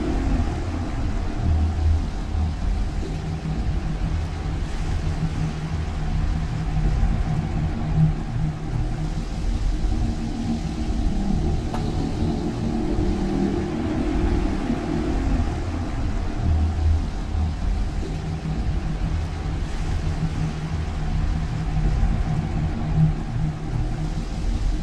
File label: ship juggernaut idle; Stellaris; machineage